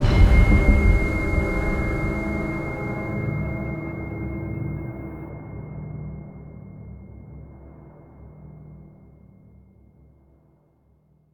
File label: sting Tense; the walking dead michonne 2 give no shelter